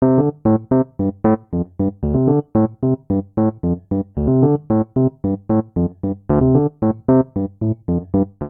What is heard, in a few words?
Ostinato Bass Descending Diads D Minor 113 bpm, Electric Keyboards; MIDI Clips; Ableton; Factory Packs